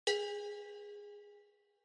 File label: Cowbell (Reverb)